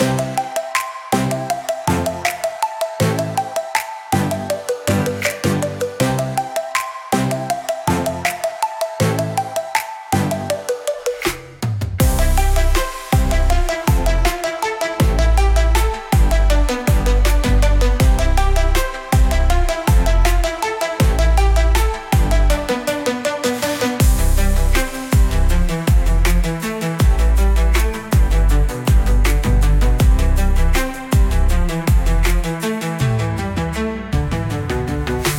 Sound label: Pop